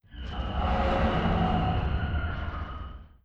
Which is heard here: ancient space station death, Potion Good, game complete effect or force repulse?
ancient space station death